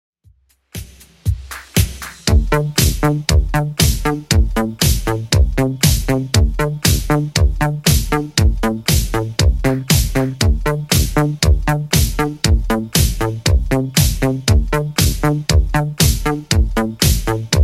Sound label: 80s Redrum 118bpm